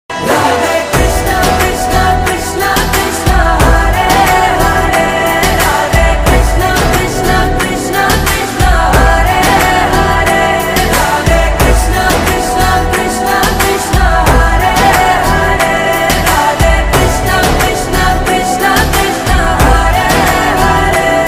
hare krishna krishna krishna hare hare ringtone, bhakti; radhe radhe ringtone